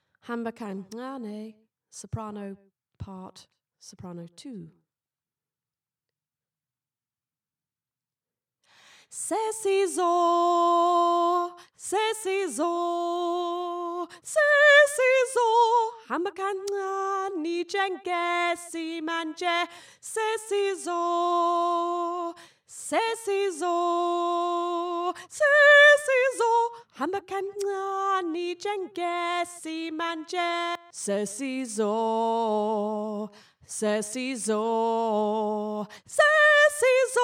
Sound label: sop 2, gospel choir; hambakancane